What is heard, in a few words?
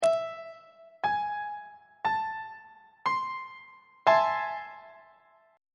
g double sharp minor major 7th 2nd inversion on treble clef